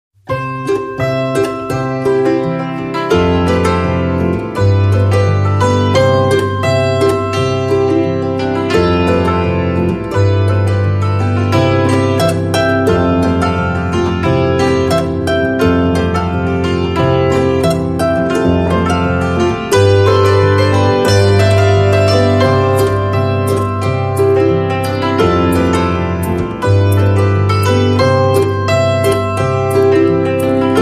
No Percussion

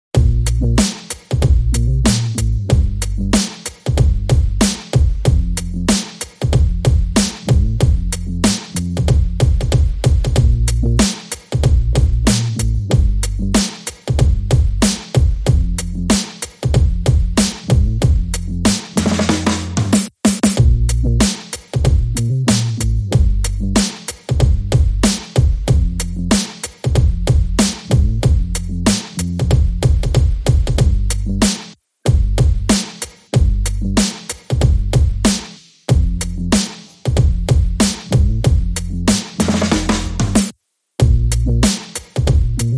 Drums and Bass

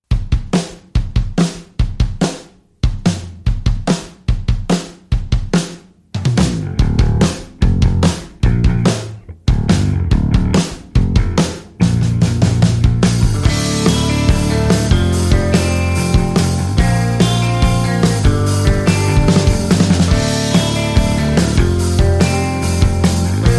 No Distorted Guitars

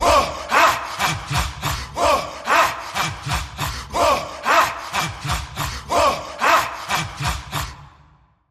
Vocal Fury Tribal Chant

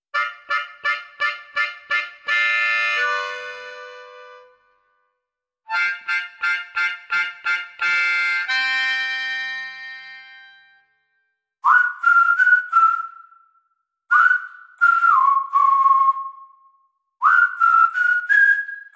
Harp Slide Whistle

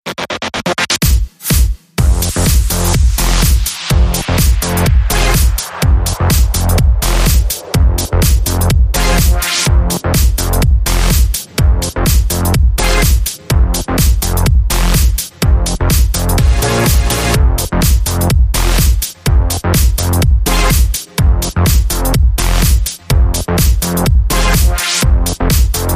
Bassline, Rhythm

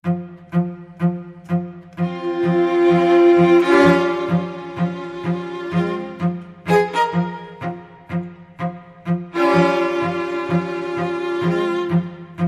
String Quartet Only

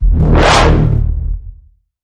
Bend The Bassline, D sharp

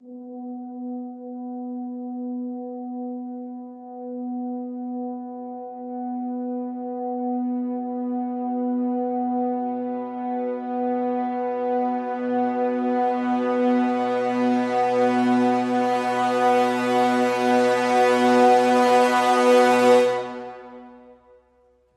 Brass Swell Hi (B)

